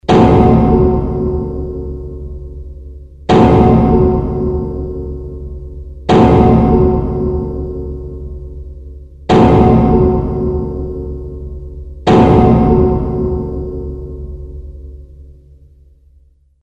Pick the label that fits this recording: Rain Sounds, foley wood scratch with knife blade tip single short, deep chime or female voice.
deep chime